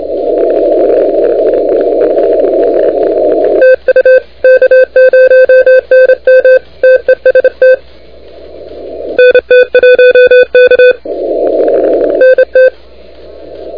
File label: CW 28MHz; ARRL10M